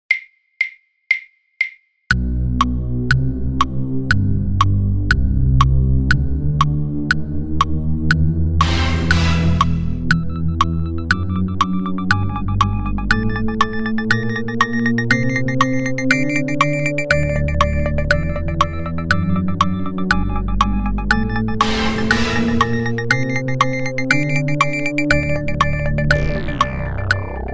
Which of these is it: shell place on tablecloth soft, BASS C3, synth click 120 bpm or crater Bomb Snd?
synth click 120 bpm